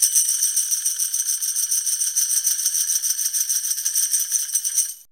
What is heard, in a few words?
tamb rattle; DRUMS & PERC